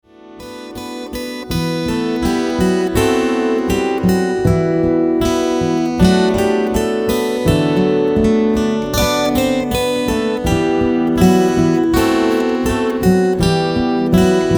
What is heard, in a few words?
St Basil's Hymn, solo guitar; early carols for solo guitar